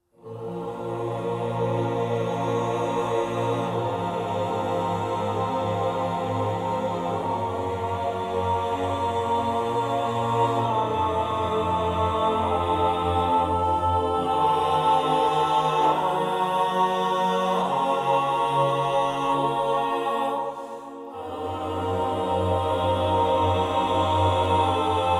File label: choir